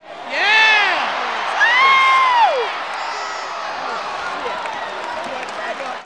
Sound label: Baseball Crowd; SFX